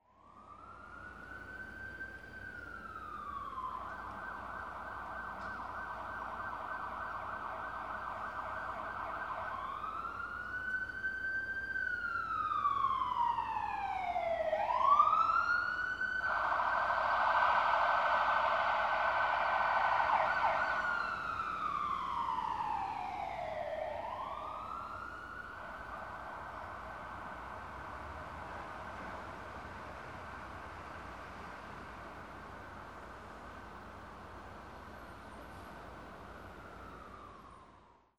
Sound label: AMBIENCE